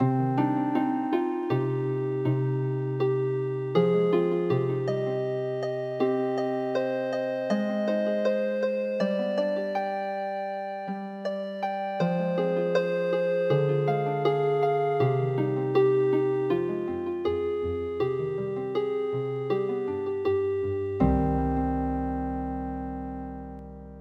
harp; red shoes

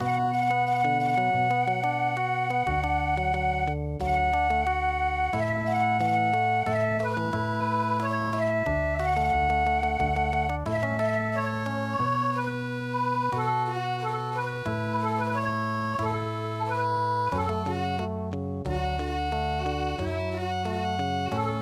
yodogawa 30sen hunauta okinawa

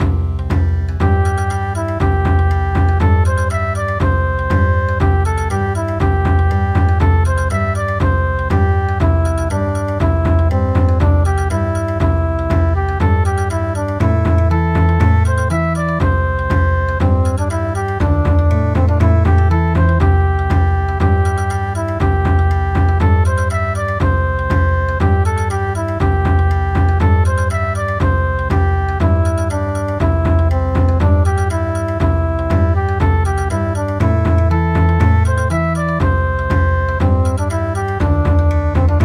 Bon Odori; Candle Dance

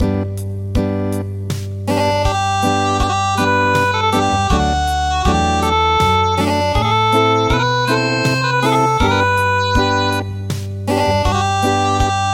Acoustic

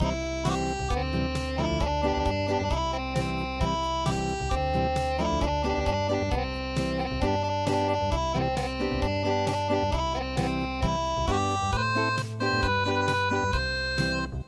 Acoustic; Helan Gar

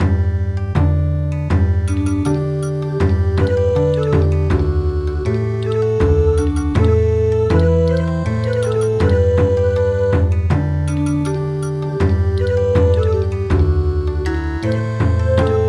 Bon Odori

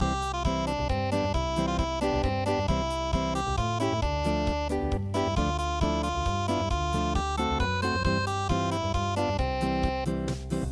unpluged; Hey Diddle Diddle

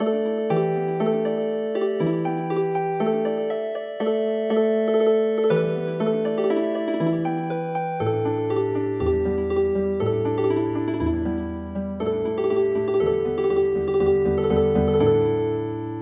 Healing Harp, traditional

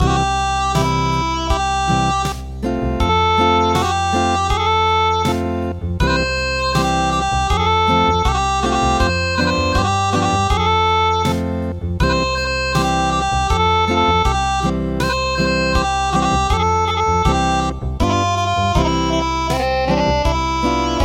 Acoustic

